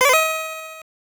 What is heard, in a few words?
COIN; ufo senshi yohko chan system 2 arcade